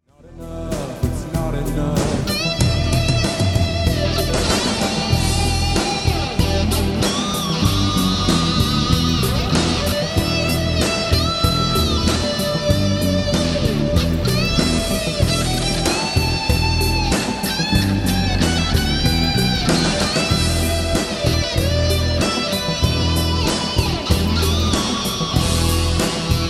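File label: solo Boss MZ2 GE7 Mesa Bassman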